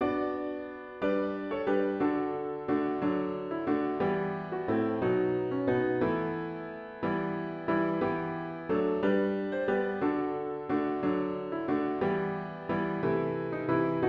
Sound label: What Child Is This piano with Intro; worship service